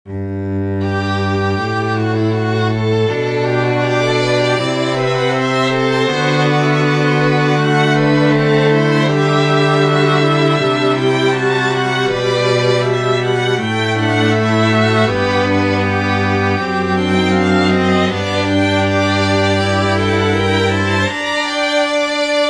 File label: double canon inversion